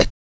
MALE PERC, Vocal Planet, Jazz FX; Spectrasonics

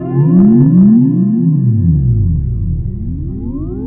Ambient FX, Ambience FX

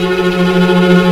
Suspense; Mo' Phatt; Digital Sound Factory Mo' Phatt

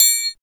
TRIANGLE; East West - Phat & Phunky

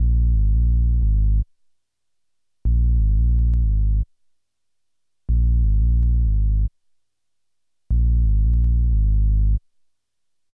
Hip Hop and R&B; Best Service ProSamples vol.02; AKAI

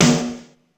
House Snare, Ensoniq ASR-X Pro

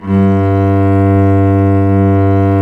cello, Arco Solo; String Master Series

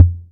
KICK, Hip Hop

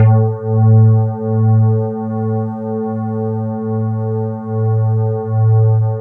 E-HARP, DX HARP; Wizoo - Powered DX

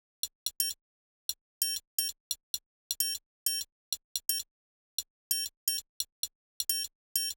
Triang Loop 130, Perc Loops 130, Loungin House; Equipped Music

